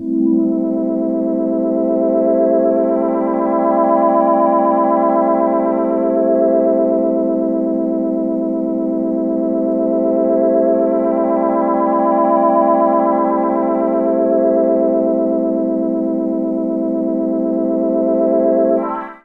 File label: pad one shot pitch mover Am